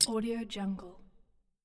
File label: bottle cap drop